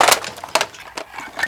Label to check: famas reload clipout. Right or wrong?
Right